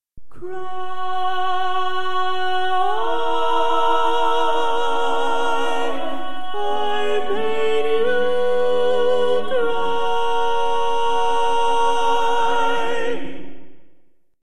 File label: Cry, Tenor